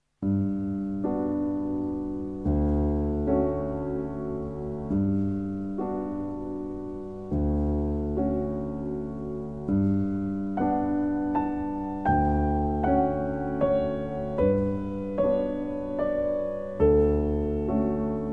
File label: Gymnopedie No.1 Piano; Erik Satie